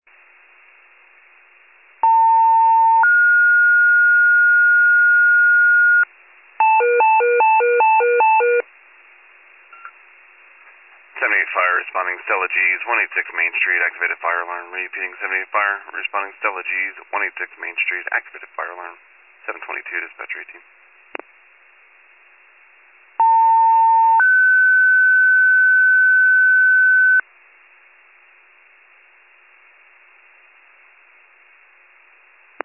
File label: FIRE pager alert